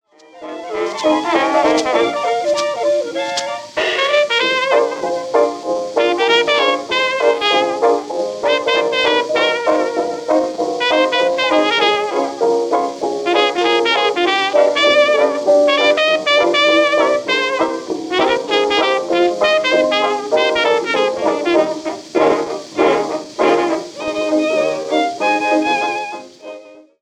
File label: Solo Restored